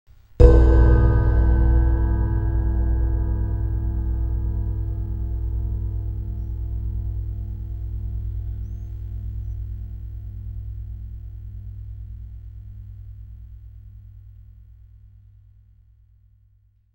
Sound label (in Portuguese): gongo grave